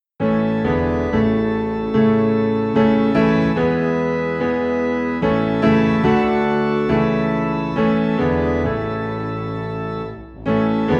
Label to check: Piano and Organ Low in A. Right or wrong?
Right